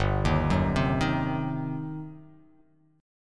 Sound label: G Major 7th Suspended 4th Sharp 5th, G, down